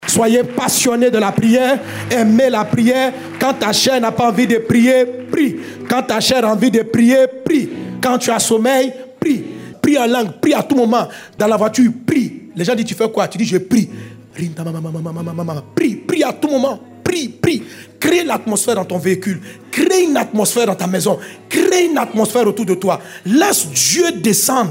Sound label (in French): REVEIL SON